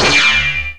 METAL, ELEC PRCS; East West; Global Sounds

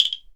CLKR TOY SH; ILIO; Synclavier Percussion 2; World & Orchestral

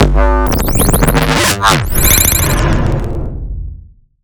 Weird Neuro, bass

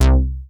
BASS C2; Q Up Arts; Dream Experience

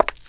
CONCRETE, footsteps; AKAI S-Series CD-ROM Sound Library VOL-6

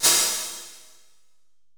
909 PHH, 480L; Wizoo - Platinum 24 Electronic; 480L MP 909

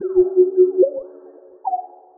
Quasar Whistle 110BPM

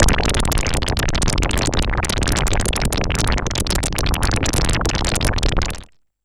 Pulse, Filtered Noise

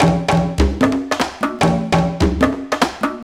Conga Groove, Percussion Loops

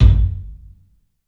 KIK, LIVE CLUB KK, Drums; 3D Sound

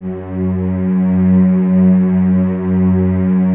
CES F#2, Cellos, String Ensembles; Miroslav Vitous; 90 s Sample CDs

